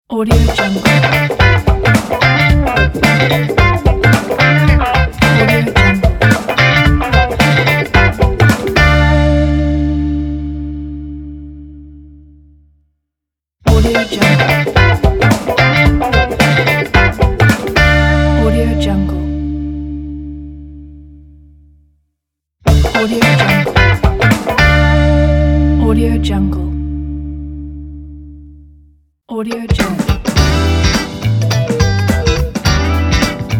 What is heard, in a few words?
funky ident pack